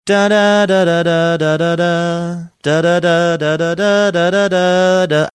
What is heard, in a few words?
singing portion of